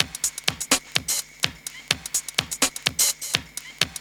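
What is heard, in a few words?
drum loop; X-Static Goldmine 3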